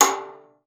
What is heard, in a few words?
PIZZ, VIOLIN